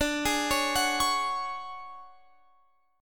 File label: D Major 7th Flat 5th D down Guitar Open F; DM7b5 arpeggiated